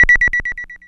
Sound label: Submarine, free techno acid samples